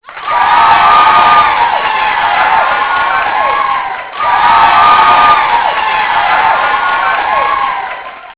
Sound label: cheer